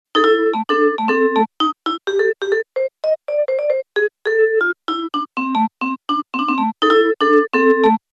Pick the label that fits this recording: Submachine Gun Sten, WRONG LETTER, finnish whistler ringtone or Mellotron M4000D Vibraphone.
Mellotron M4000D Vibraphone